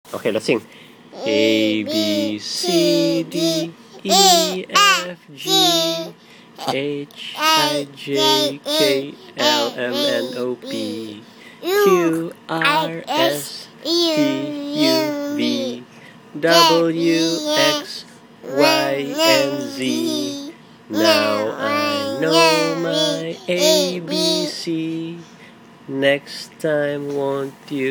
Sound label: alphabet, singing a b c